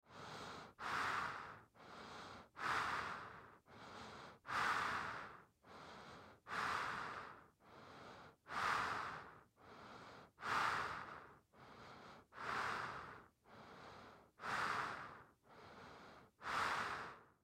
Human Breathe Rubber Mask; Scary Breathing; Horror; Blastwave

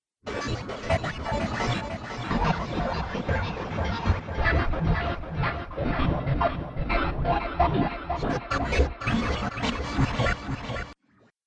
Alien Radio Tuning; Alien Sound Effects

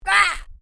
MALE VOCALIZATION ARGH; Male vocal sounds; Exclamations; Voice

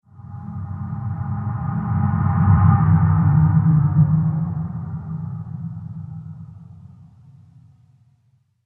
Dungeon Darkness Transition; Transition Sound Effects

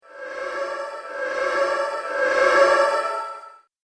Throbbing Ghost Pulse; Sinister, Scary, Spooky Or Just Silly; Haunted House Or Graveyard; Andrew Fleming

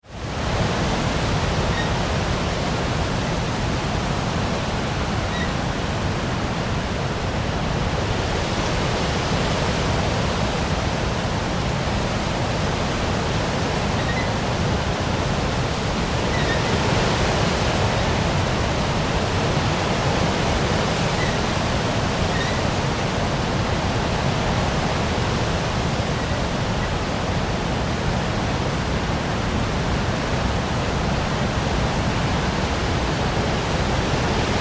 Wind in Trees Heavy; with Birdsong; Weather; Tom Hutchings

